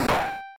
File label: Bonk